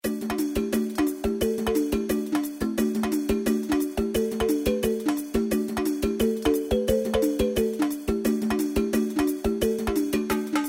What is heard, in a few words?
turntable bgm